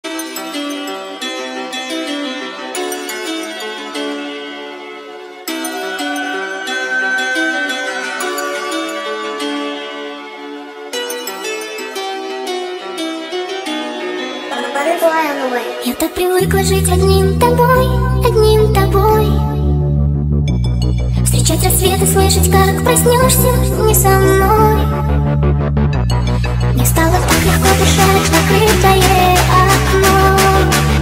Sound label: hardstyle remix